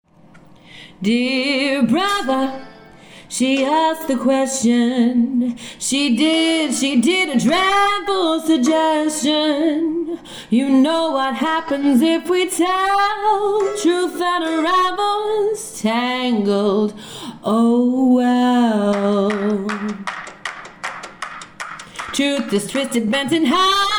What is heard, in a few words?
Vocal; Auditions